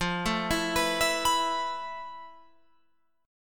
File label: F Major Sharp 11th, Guitar, Open F; arpeggiated